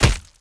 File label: knife hit